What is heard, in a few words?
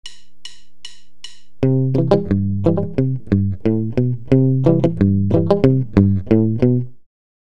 Palm muting in a country riff, guitar